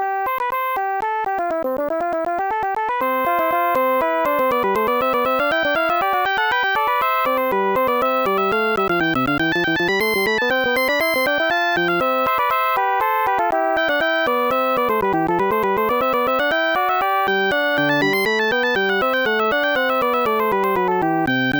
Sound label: prelude; The Music Studio